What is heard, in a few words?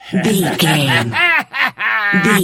voice male laugh